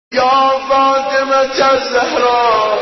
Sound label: sms